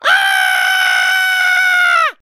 Defeated; Monkeyboss

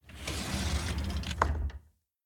Door Slide Open Glass; Devour; The Inn

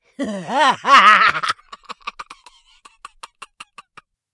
laugh

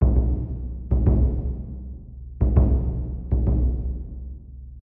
loop 200bpm; chicory a colorful tale; macos ps4 ps5 switch windows gamerip 2021

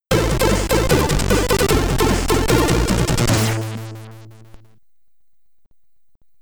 Get Ready! (SID Stereo), commodore 64; Dyter 07

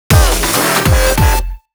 Join Jingle; Tekken Tag Tournament 2

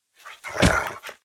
Warbeast Charge

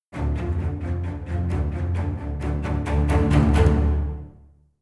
Combat In; The Witcher 3 Wild Hunt; Skellige

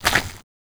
walk gravel

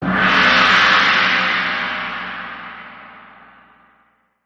Big Gong

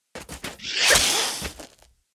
Snake warrior Attack; Puzzle Chronicles; PS3; PSP; X360

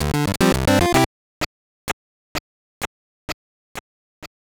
Jingle, gb

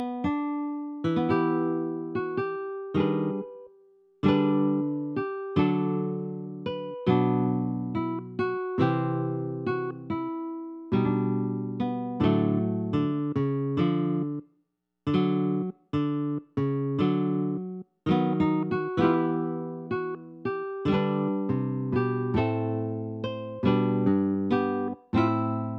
Playing the Lute; betrayal at krondor

